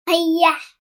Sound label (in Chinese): 礼物